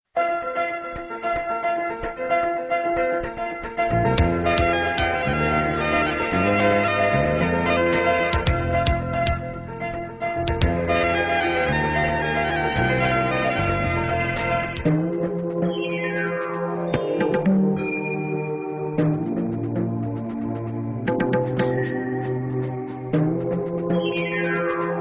evergreen romantic

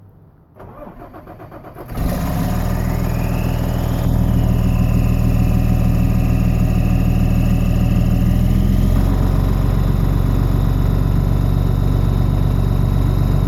Cummins Diesel Start

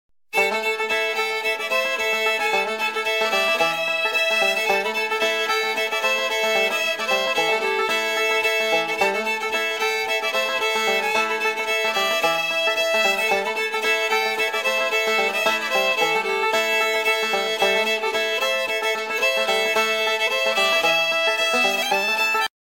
blues, ringtones

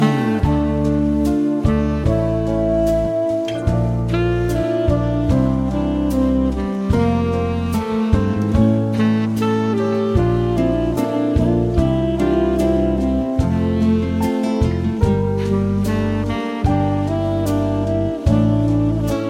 Quiet music

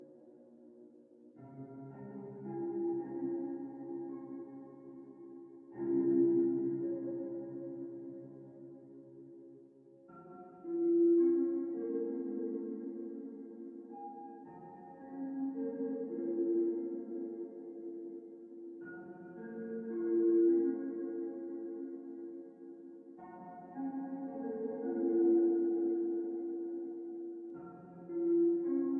Key; minecraft

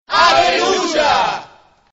aleluya ring tone

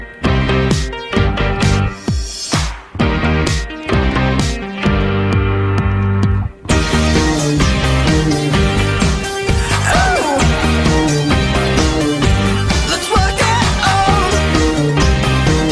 iphone4 ringtone; ringtones; sms

